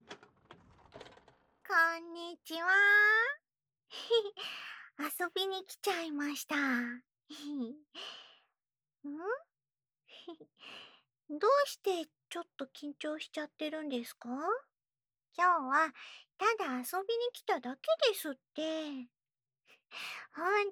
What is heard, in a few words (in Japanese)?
右耳ふーふー; こんなで感じちゃうとか、ザコすぎない？